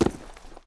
FOOT

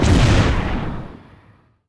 Woosh

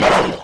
Hit

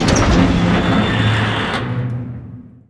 Door Open Metal

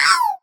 Die

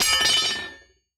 Drop Axe

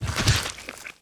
Fall Liquid

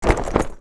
drop chest

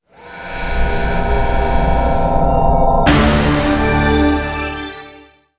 Succes

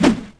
Weapons Swing

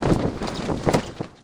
Flag

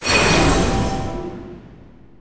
Long-distance Totem Regen; Summoner

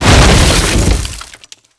Door Open Wood

